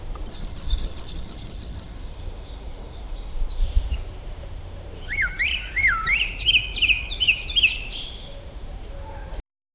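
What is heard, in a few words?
single bird singing softly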